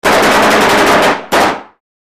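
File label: Bullets Hit Wood Multiple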